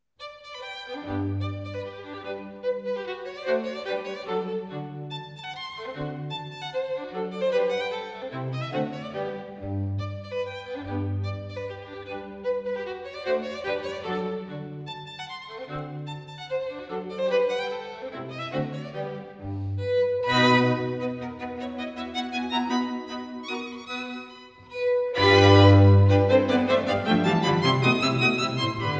String Quartet in G Major Scherzo Allegro; String Quartet No. 2; Op. 18 No. 2; Ludwig van Beethoven; Beethoven - String Quartets Nos. 1-16

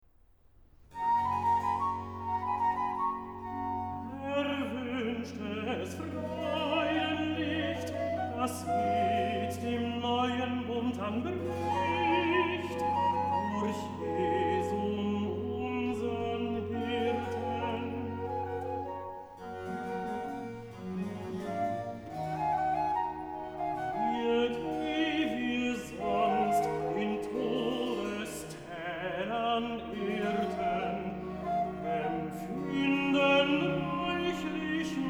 Recitative; Erwunschtes Freudenlicht, BWV 184; Erwunschtes Freudenlicht (Tenor); Bach, J S

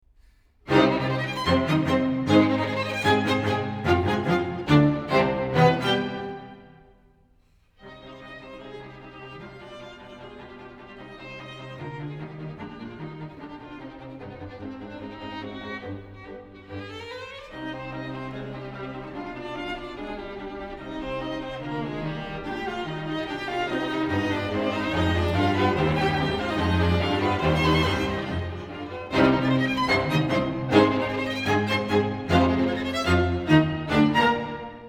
String Quintet in C Minor, Finale (Prestissimo); Op. 104; Ludwig van Beethoven; Beethoven - String Quintets Op. 29 & 104

